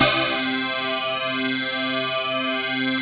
SYNCLAVIER; DIGI2 FM+SMP

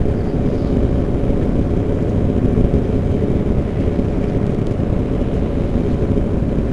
Drone Ambient; Drums & Drones

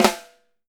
6X12 ALUMNUM, Living Drums

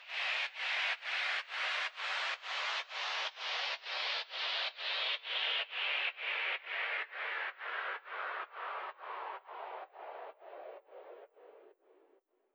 FX Downlifter SD; VEC5 Multi Loops

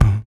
Body Perc, Human Perc; Spectrasonics; Supreme Beats; World Dance

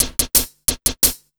DRMSND, Underground Hip Hop, BEATKIT; USB Soundscan vol.02; AKAI

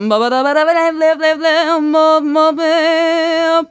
VOICE, Balkanish Traditions; USB Soundscan vol.63; AKAI

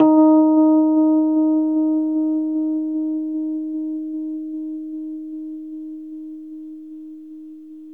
RHSM D#, Rhodes; 3D Sound; 3D Keys VOL-1

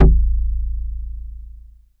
Bass A0, Prophet; ShortBass2; Oldies

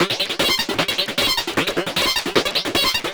SPITTLE, arpeggios; ILIO; Trance Fusion

